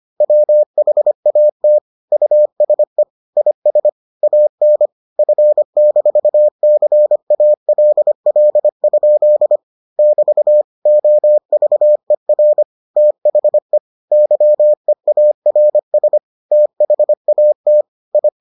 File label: morse